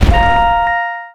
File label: Character Attack